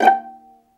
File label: violin; ILIO; Synclavier Strings